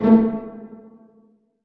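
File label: string pizzicato A2 mezzo forte right; Sound On Sound - Peter Siedleczek's Orchestra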